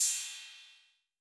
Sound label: Cymbal A 808 Decay A, Clean, Digital